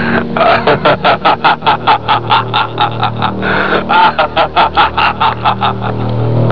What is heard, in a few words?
laugh